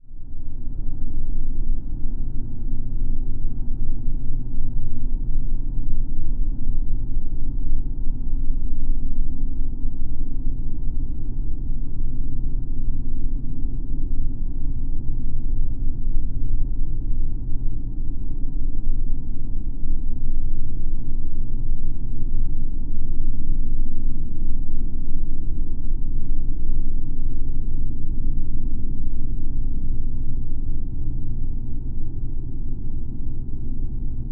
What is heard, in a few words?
Ship Rumble, SCIFI, AMBIENCE